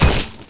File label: Chainarmor; Knight Online